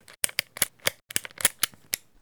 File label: magnum handling